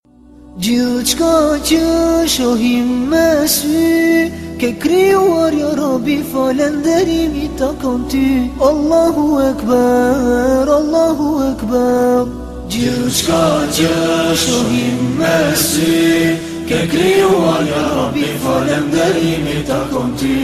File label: Ilahi Shqip